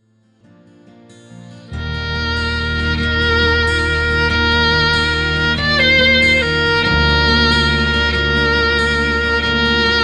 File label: solo minus